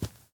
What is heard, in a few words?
grass wander, step